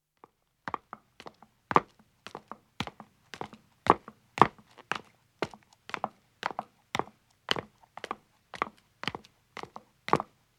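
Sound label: Footstep stone descending, 2 women start and stop; Part of Footsteps (Sound Effects:Human); BBC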